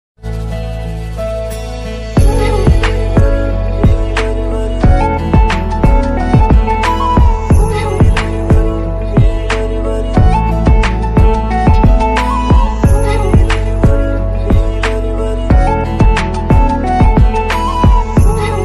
call ringtone, hindi ringtone, sad ringtone, love ringtone song